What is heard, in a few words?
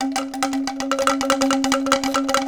C#3 anklung, Java anklung; WorldSounds